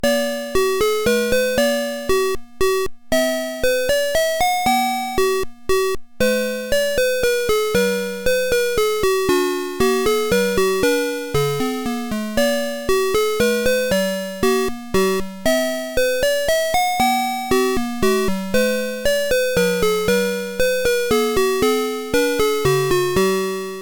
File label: menuet sq